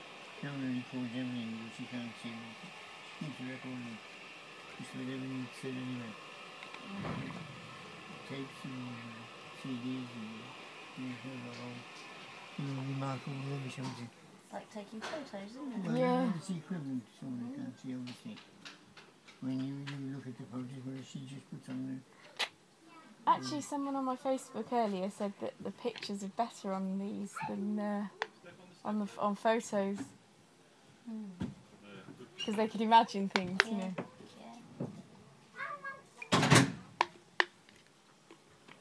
other train leaves churston then ours listen for whistling beforehand then great tr